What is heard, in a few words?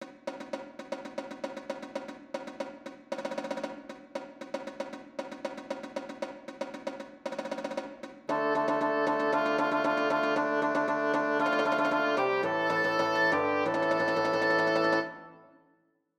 Fanfare; MTWFC VOL2 Parts1&2 Audio Accompaniments